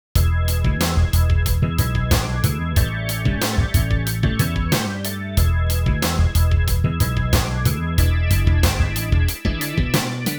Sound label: Slap Shake; Bacchus